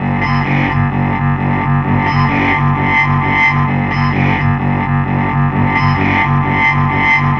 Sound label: Ugly, Industrial, Nasty